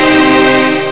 STACK, Big Beat; AKAI; STACKS KIT

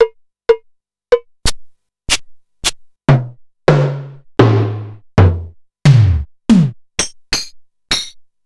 Simmons MTX9; FactoryC

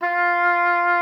F4, OB D.AMORE; Xsample VOL-7

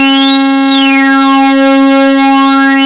SYNC LEAD; WS#4 ROCK

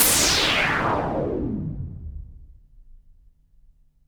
NOISE SHOT; Best Service Alpha Dance vol.2

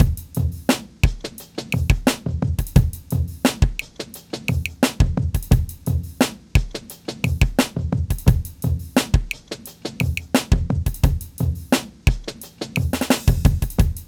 Drums, Hip Hop

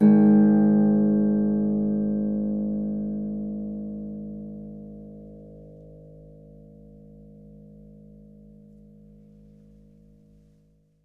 H A1, Harp; Miroslav Vitous - Percussion & Harp

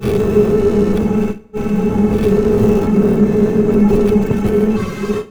loop, techno atmos

